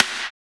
TIME SNR; BONUS SN2

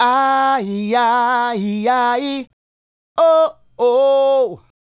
Male Solo, Hip-Hop and RnB Vocals

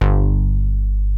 MIDI bass G1; Greytsounds VOL-1

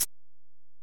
Ac Perc, Drum Machines Alesis DM-PRO

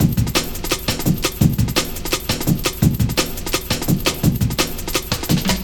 Break, Drum & Bass, Beats & Breaks; Fluid Dynamics

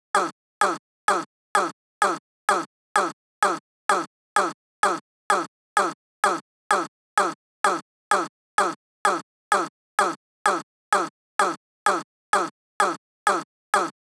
128 BPM Vox FX dry; Vengeance - Essential Clubsounds Vol.5; Demo Constr Kits; Big Line Kit Root G

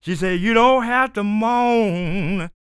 SING, Blues Soul; Spectrasonics Vocal Planet

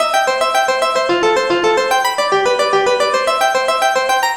Synth Melody; Eclectic Electronica

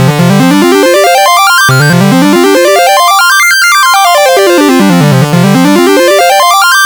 C64 Synth

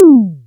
ELECTR TOM, ELEC DRUM; USB Soundscan vol.20; Fresh Disco House I; AKAI

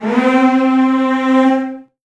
C3, Virtuoso Strings; ILIO; Kirk Hunter Virtuoso Strings VOL-2; 90 s Sample CDs

